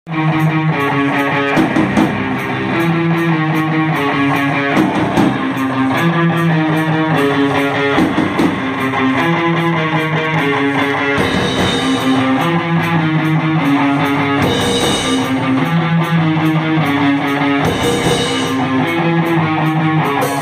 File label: intro live studio